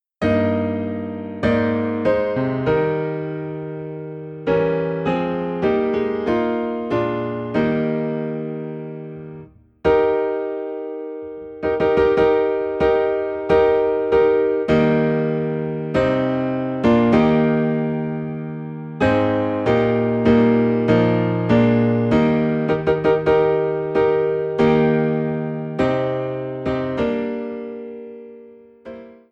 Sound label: Piano in E Flat; God of our Fathers